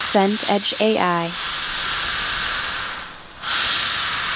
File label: breath through nose